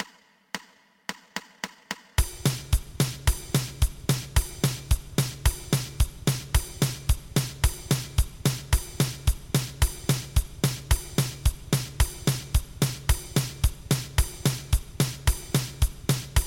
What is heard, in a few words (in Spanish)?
2 pulsos